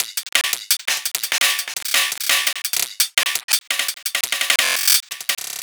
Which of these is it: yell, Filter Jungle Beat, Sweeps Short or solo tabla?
Filter Jungle Beat